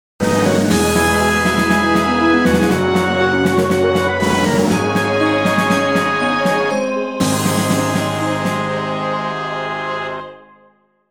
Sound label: Jingle Stage Start; Gensou Shoujo Wars: Dream of the Stray Dreamer; Kaze